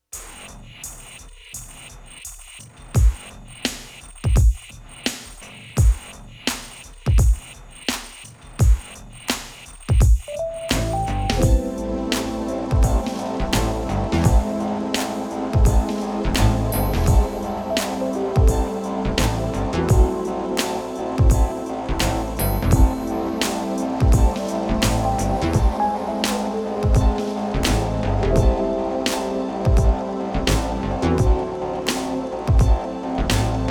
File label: absynth hip; Tplanetmusic; PETER RITZ / R&R Entertainment